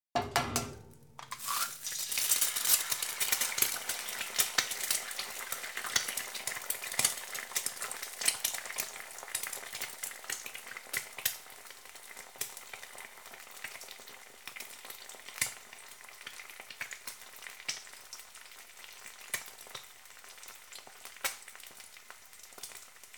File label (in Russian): zvuk zharki jaichnicy